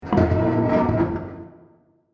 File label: Vampire Opens Coffin; Sliding Door Sound Effects; horror sound effect